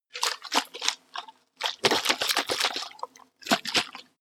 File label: jar small shake water